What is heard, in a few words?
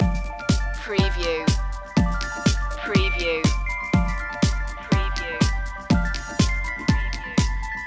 disco loop